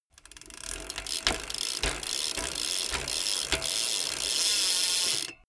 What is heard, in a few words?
bmx bike pedal accelerate freewheel stop short